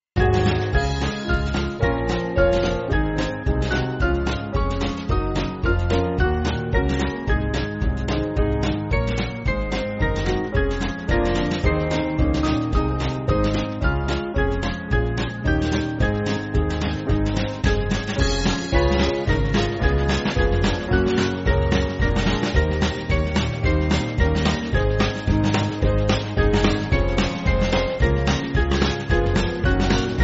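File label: Band, Music Backing; O Lord Our God; Republic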